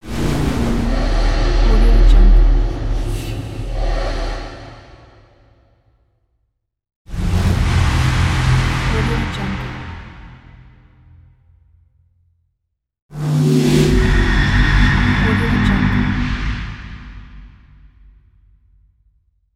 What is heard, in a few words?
dark horror